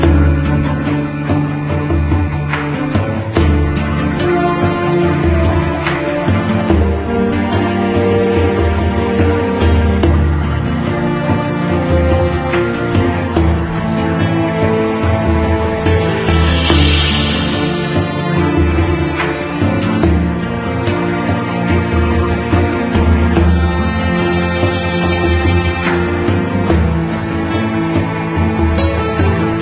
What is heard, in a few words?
Music; Brave Fighter 2 Monster Legion; Android